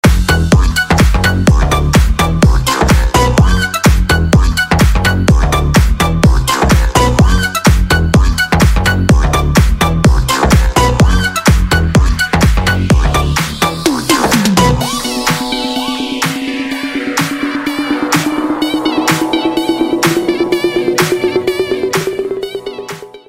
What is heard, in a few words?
Shenai, ringtones